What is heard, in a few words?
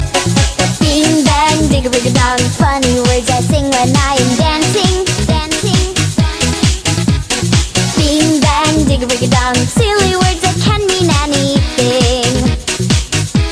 bing bang; ringtone